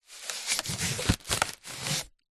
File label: balloon foil small inflated rub hand; foley